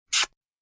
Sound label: Kiss Peck, 1 child, small, smooch; Kissing; Kisses; kid; human voice